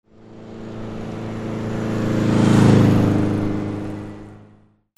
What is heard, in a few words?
VEHICLES